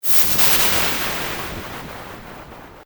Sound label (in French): lave